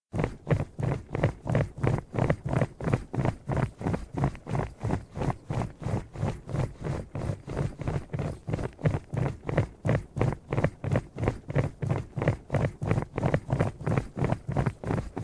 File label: Running city guard; SyrinScape; City gone mad